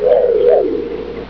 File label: knight rider red sensor